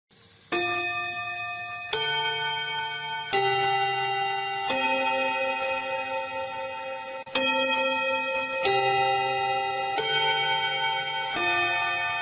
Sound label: Japanese school bell